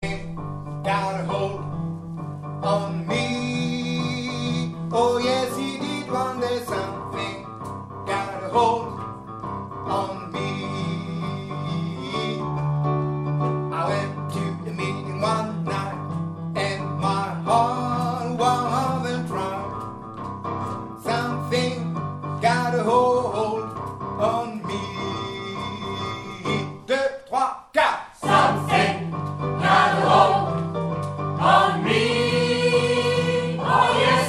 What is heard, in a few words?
soprano basse